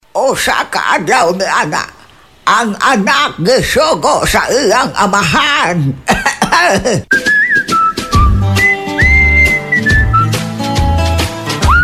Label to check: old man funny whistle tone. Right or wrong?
Right